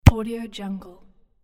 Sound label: single soccer kick